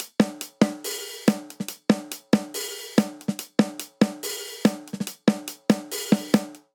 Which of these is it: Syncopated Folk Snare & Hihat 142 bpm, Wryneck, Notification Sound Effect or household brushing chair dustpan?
Syncopated Folk Snare & Hihat 142 bpm